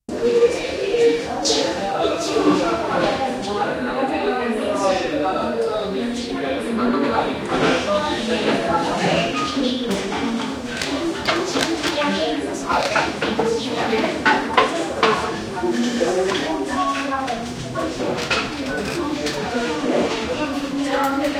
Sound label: ambience of an antique shop